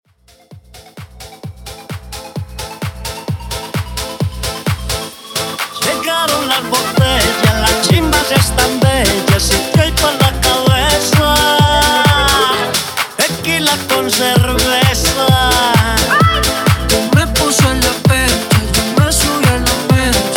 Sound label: Extended 130bpm